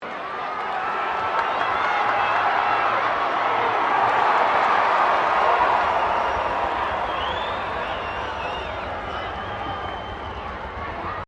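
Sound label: crowd 15K Rugby World Cup cheer surge, Rugby world cup game; Crowds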